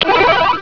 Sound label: ClikTweedle